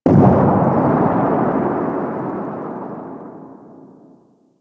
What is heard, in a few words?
Pod Launch Distant; Warframe; Grineer Ocean